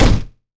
gun

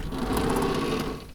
window

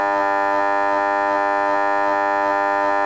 buzzer

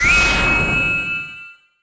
laser

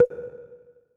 pon

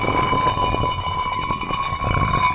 stone

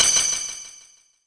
power

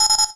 shoot

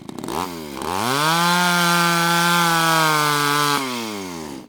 chainsaw

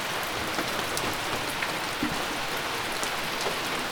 rain

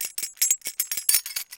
metal hit

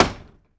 slide door

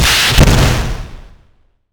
lightning

